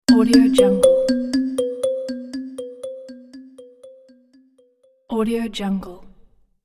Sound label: calm pleasant notification